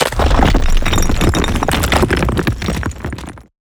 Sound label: debris falling rock loud; video game; Bluezone Corporation